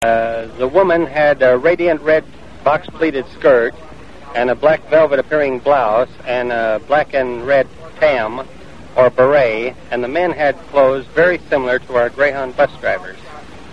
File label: Alien Clothes; incidentals